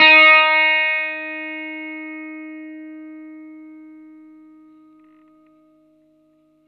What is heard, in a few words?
GUITAR NOTE EF+, Vintage Blues Guitar; USB Soundscan vol.22